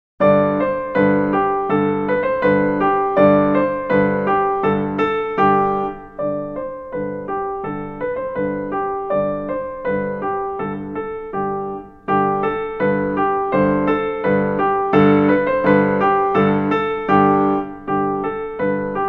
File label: Bagpipe (Dudelsack); Marlais Book 0